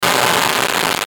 Static Fuzz Distortion; Production Element Imaging Element Accent Transition; Blastwave; Static Sound Effects

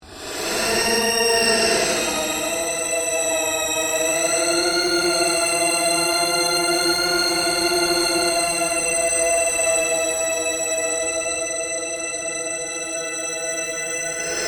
Ambient Drone Ghost Chatter Scream; Sound effects created by manipulating other sounds; Scary Soundscape Sound Effects

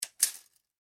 industrial tools tape measure metal tape movement; Metal Measuring Tape Movements; Machinery; Machines; McKinney Sound

